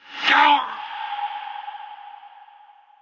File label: Wound Crit; King Terenas